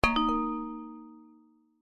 multimedia alert notification ui ping chime mallet like; zapsplat